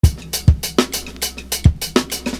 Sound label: Beat, Hip-Hop Street Beats